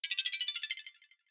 video game sound

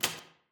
keydown

